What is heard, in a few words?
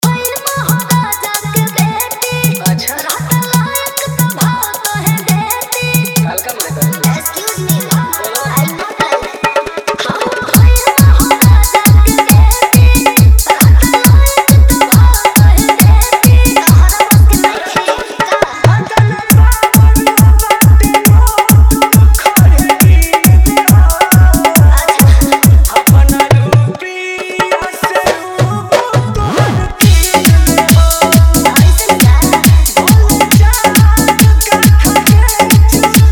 New Viral Bhojpuri Dj Song Hard Dhollki Bass Mix